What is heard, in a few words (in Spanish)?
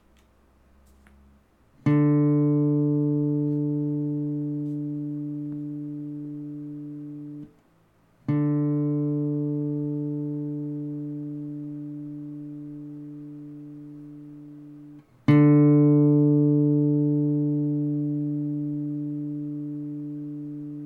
Sonido de guitarra acústica cuerda 4 Re D